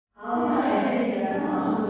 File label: Reverb Hall Room